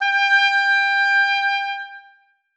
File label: oboe g5